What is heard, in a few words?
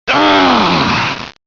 stunned; xinalized